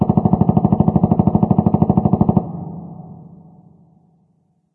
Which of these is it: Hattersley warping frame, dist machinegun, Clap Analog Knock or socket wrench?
dist machinegun